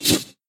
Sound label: attack; new champion